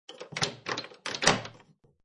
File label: Turn Key In Wooden Door; Miscellaneous Doors; Sound Effects:Doors